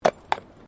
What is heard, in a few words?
Tram Horse Drawn Horse Step Pavement